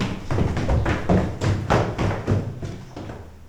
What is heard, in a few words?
FOOTSTEPS, Science Fiction; Mower Man